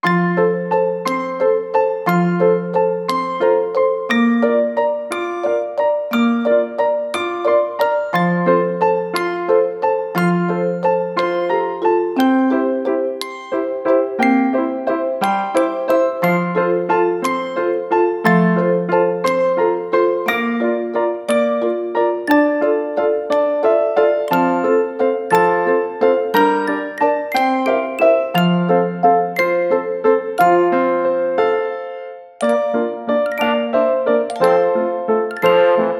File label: Doll Waltz